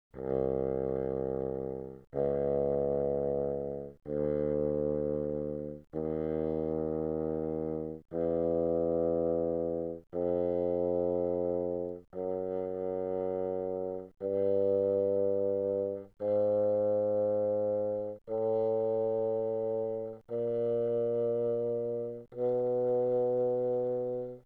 Bassoon pp C2 B2, woodwinds

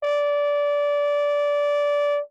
Horn ff D5, Brass; MIS Pitches - 2014

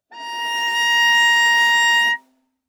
Viola arco ff sul D Bb5 stereo; MIS Pitches - 2014; Strings

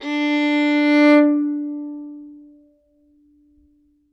Violin arco ff sul D D4; MIS Pitches - 2014; Strings

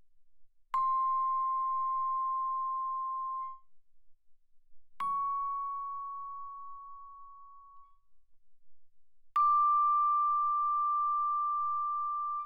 Vibraphone short sustain ff C6 F6; Percussion

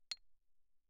xylophone rosewood mf C8; Percussion

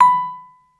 Vibraphone dampen ff B5 stereo; MIS Pitches - 2014; Percussion

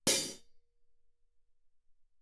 hihat, shoulder, ff; Percussion; cymbals

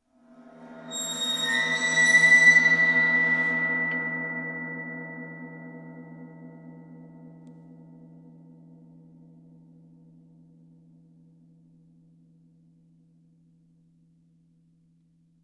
tamtam bow stereo, Percussion; MIS Pitches - 2014; Tamtams

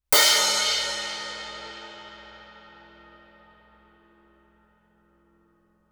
crash stick normal ff stereo, Suspended Cymbals; Percussion

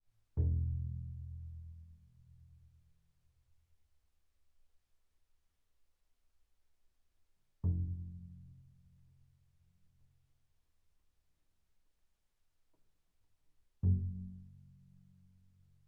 Bass pizz sul E mf E1 B1, double bass

